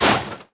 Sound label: droid land